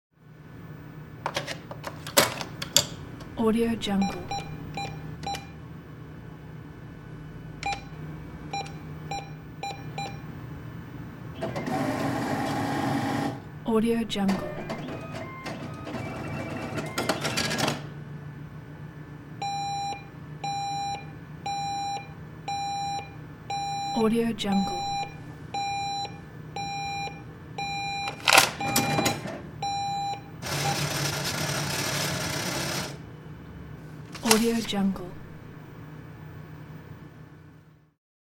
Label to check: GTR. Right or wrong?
Wrong